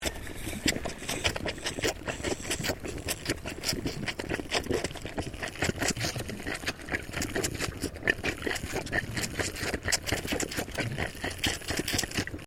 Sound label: Animal Goat Boer Kid Bottle Feeding; Animals from Edgar's Mission farm in Victoria; Goat Sound Effects; Foundation1